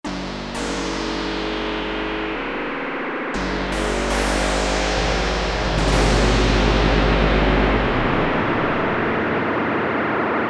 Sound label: IMPACT, Oberheim OB-8; Factory Patches